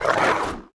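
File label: Hungry Wolf Attack; Seer; GamigoNA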